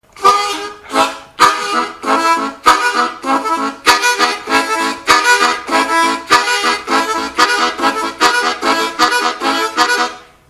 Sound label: Panamerican, harmonica